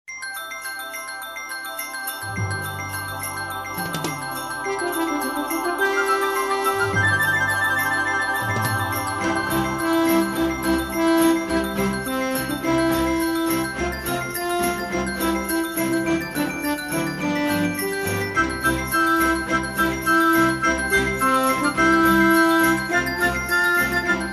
JINGLE BELLS RIDE

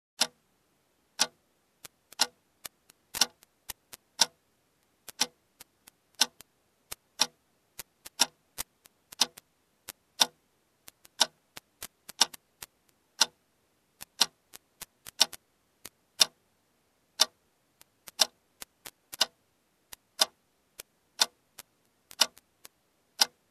clock

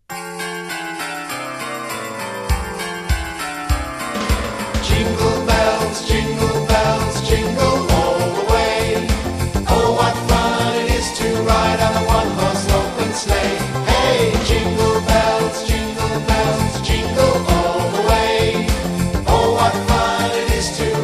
JINGLE BELLS SUNG VERSION

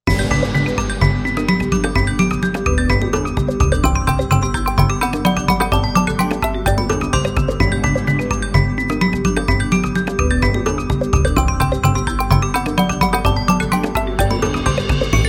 JINGLE BELLS SPRIGHTLY MARIMBAS PERCUSSION

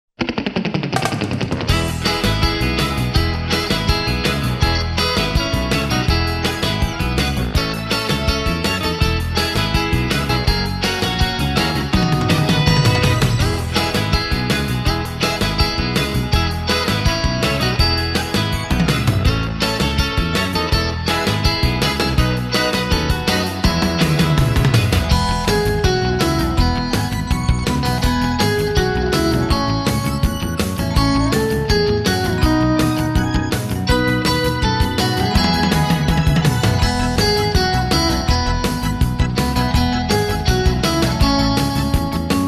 JINGLE BELLS SURF

